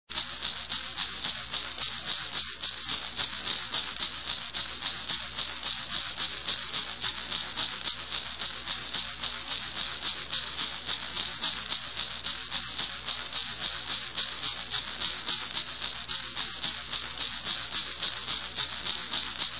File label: rattle